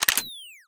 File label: turret asleep